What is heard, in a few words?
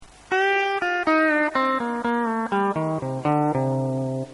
Minor Four